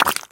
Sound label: spew, infestation, bulb